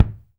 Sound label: BD, DR BD; Best Service Gigapack 2